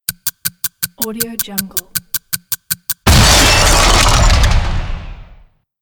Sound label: bomb clock and explosion